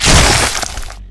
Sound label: zombie slice